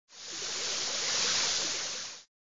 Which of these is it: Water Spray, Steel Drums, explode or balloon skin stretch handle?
Water Spray